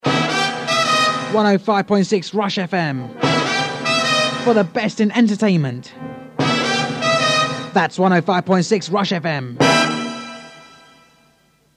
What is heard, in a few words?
jingle